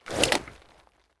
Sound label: holster, combat; CosmicRage